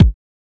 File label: kicks